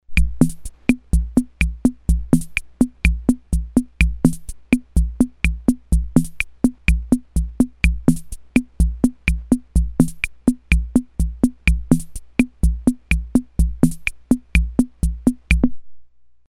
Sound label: BEGUINE, Ace Tone Rhythm Ace FR-2L